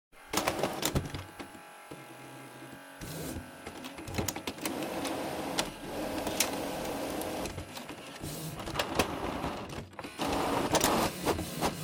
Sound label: Printer Copy